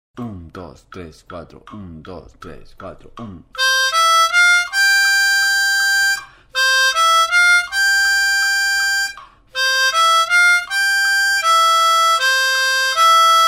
OH WHEN THE SAINTS, armonica

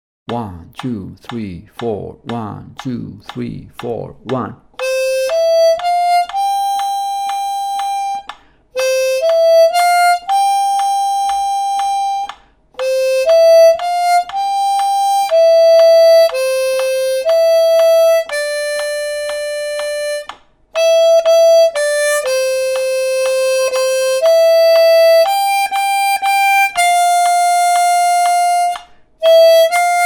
OH WHEN THE SAINTS, armonica

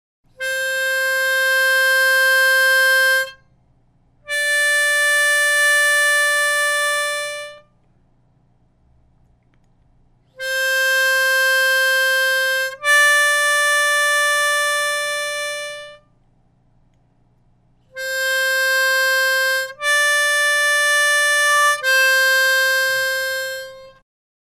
Ejercicios Celda 4 sin movimiento; armonica

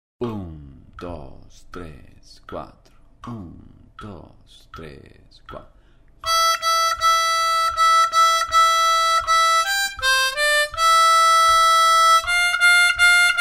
JINGLE BELLS, armonica

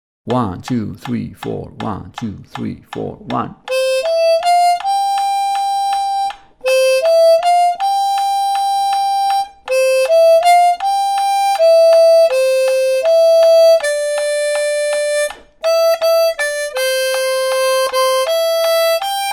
OH WHEN THE SAINTS, armonica

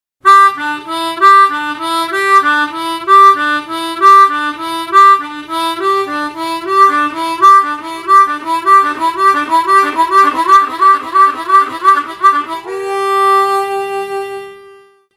asp asp sop, armonica; Ej.6-2asp-1asp-2sop

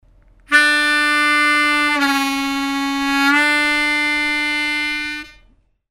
bend asp, armonica

